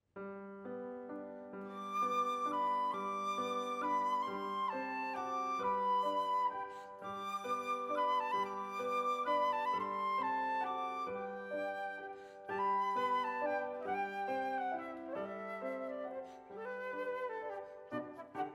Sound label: track with vocal